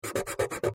Eraser On Paper Pencil; Office; Blastwave

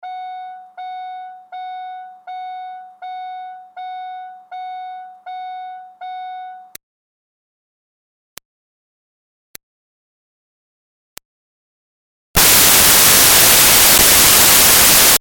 Car Smart Car Door Chime; 2005 Smartcar Fortwo; Vehicles; Big Room Sound

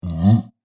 Giant Ogre Confused; Monster; horror; Monster Sound Effects

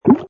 Wet, Button sound; Multimedia; Lynne Music

